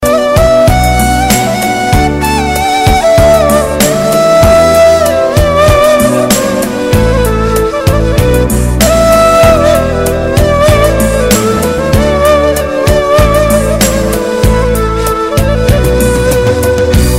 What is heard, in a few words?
Turkish Ney instrumental Ringtone Traditional Flute Music